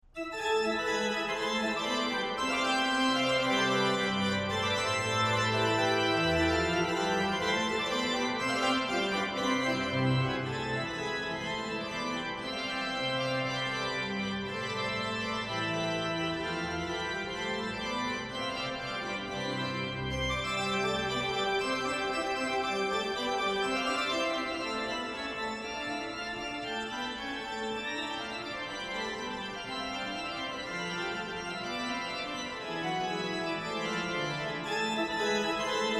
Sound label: Sonate alla Scarlatti, ORGUE